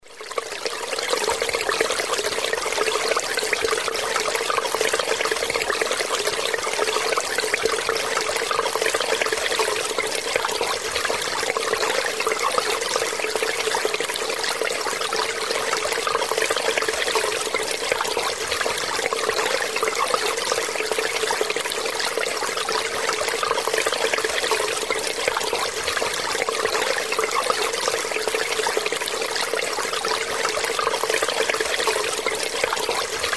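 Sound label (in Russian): jurchalka vody, water murmur; v pomosh roditelyam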